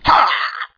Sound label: jump; sven; npcs; dr douchebag